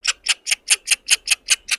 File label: Pets Skunk Ecstatic; creatures pets; Lego